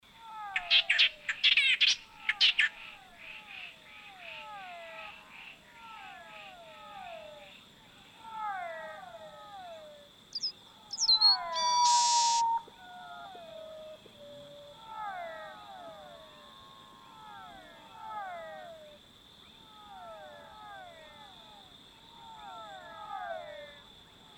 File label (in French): oiseaux du venezuela; Jean C. Roche; Crazy material recorded in the Amazonian forest by bird specialist Jean C. Roche